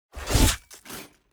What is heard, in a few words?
crusader strike sword